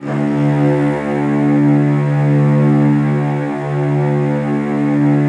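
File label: CELLOS E2, Strings; ILIO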